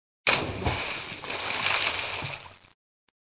jump into the water

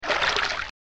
Water

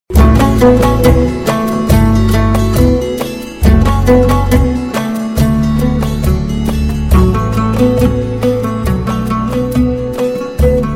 Soothing Tune

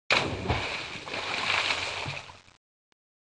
Jumping into the water

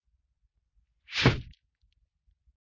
Swish Broom Hit

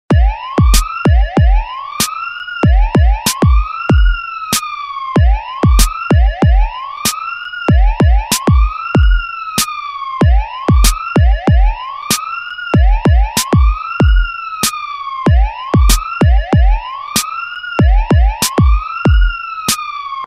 Siren Beat

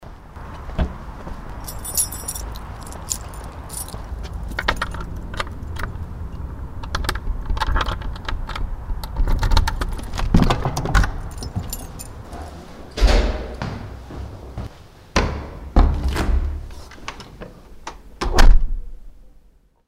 Key Tone

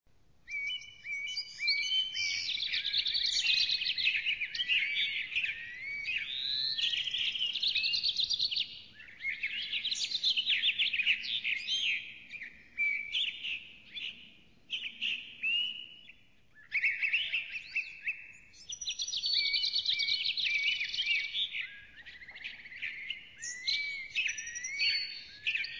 Birds Songs And Calls

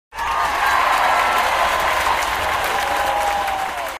Cartoons Applause

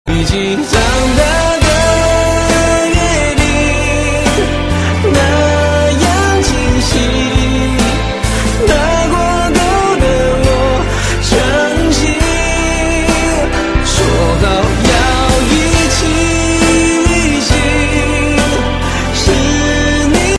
Gong

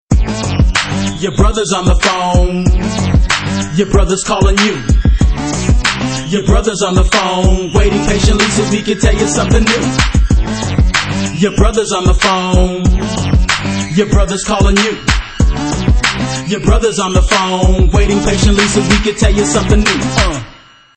Rap Song

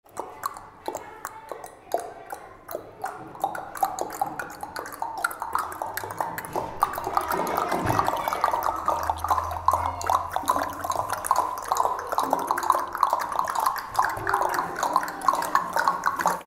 Horseshoe Imitation

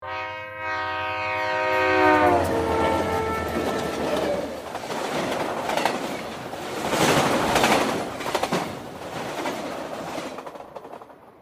Train Ringtone

